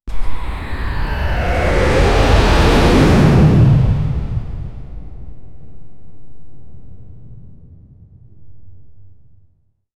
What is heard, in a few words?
the overloaded sound of a, ships